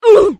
sad oof; freak fortress 2; roblox; noob

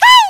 spy pain sharp, voice packs; spy jackson

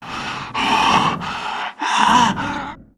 female zombie pain; nmrih; zerosiki; slender

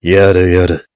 heavy positive vocalization

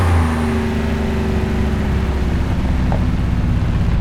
revdown; vehicles; fordrafa; nfs heat ganimator pack

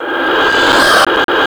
normal idle; floaters; slender

